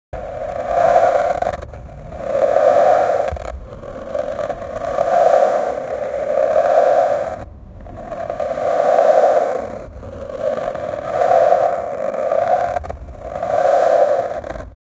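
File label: skateboarding in a straight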